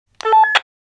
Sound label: Walkie talkie turn on push button beep